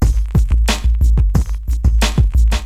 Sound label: Drum loop, HipHop; LiveAct Pool 1